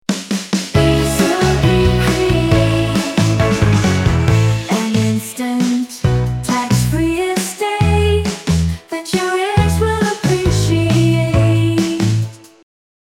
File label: Jingle; Basoco Agency